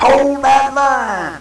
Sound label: FIRE; PARADISE